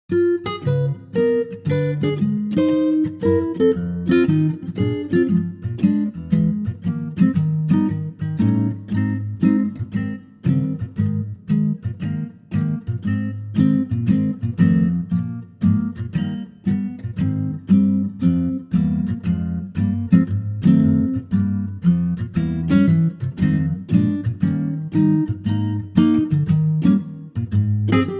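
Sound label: Ab Ac Gtr, male; key - Ab - vocal range - Bb to Db; Wonderful acoustic guitar arrangement; lightly swinging; Wonderful acoustic guitar arrangement of this gem from the musical "Oliver", lightly swinging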